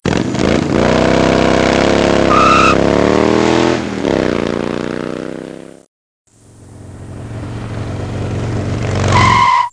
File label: flea bike